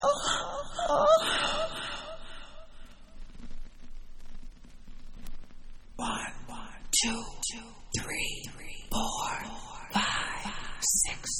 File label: Moan Mix